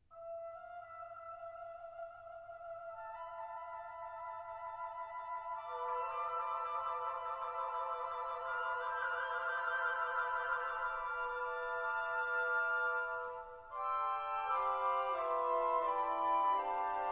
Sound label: Sketches for trombones and band